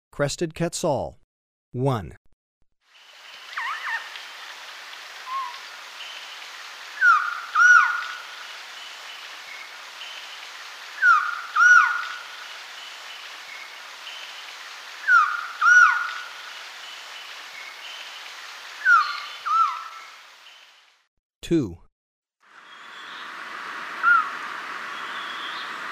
Crested Quetzal, Birds of Ecuador; S. America

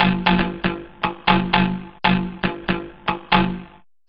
Framed Beat, Electronica, Solo Beats

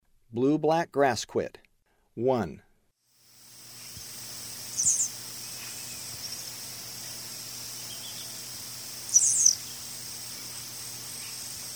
Blue-black Grassquit; S. America; Eastern Lowlands

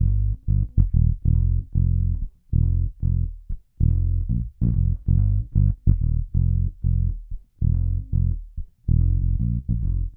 Bass Hip-Hop

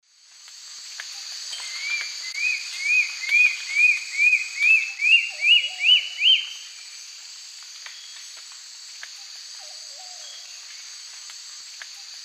Myrmornis torquata, song; S. America; Eastern Lowlands

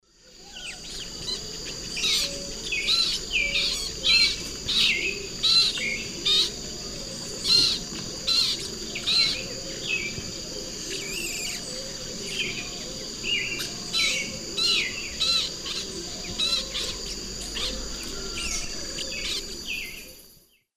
Forpus xanthopterygius, begging calls; S. America; Eastern Lowlands

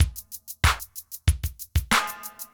funked 94

